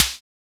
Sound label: Checkmate SD, Keio Checkmate